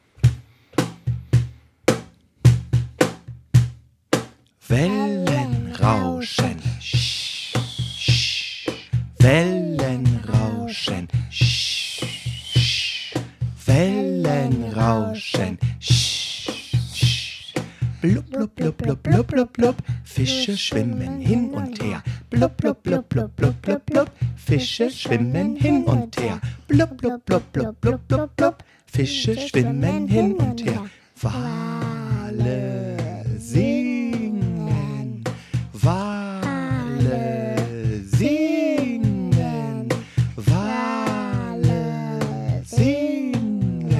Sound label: Rhythmical